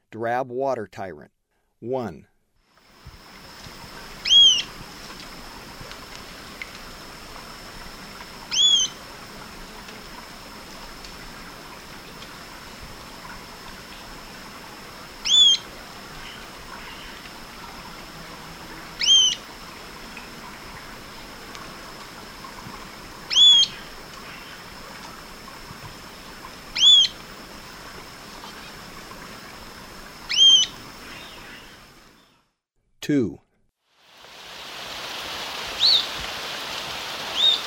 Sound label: Drab Water-Tyrant; S. America; Eastern Lowlands